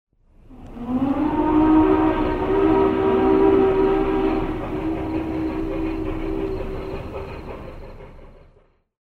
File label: Class 4-6-6-4, Summit; Omaha NE; hancock long bell 3 chime whistle; 3800